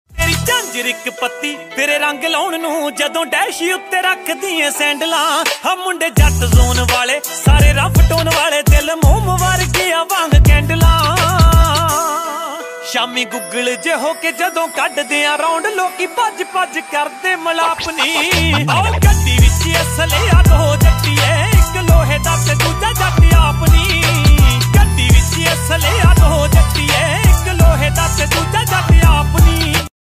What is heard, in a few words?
Popular Punjabi Ringtone